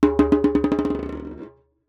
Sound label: Jembe Bounce; Percussion Samples